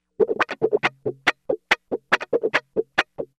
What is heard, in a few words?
Pop Guitar Wah, C#m, Electric; Pop Rock